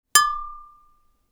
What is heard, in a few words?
UI Quirky